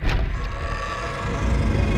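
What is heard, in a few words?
Music Volcano 120; From Dust; ps3; xbox 360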